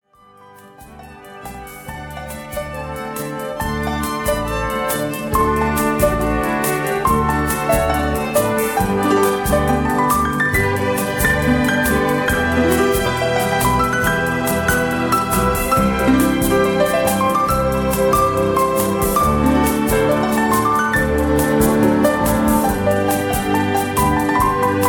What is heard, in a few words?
PIANO; dances